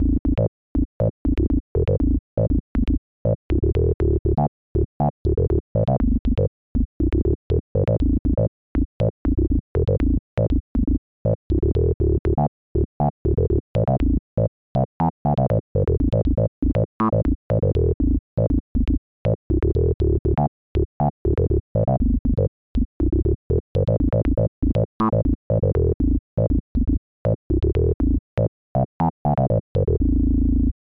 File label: phase distortion